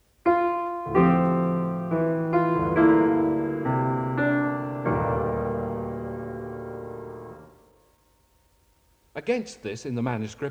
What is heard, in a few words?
Music Example (Piano); Deryck Cooke; Illustrated talk, Symphony No.10